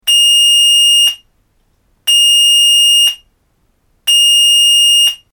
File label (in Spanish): tono